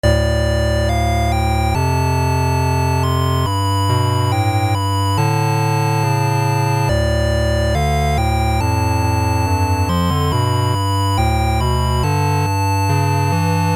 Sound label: Loops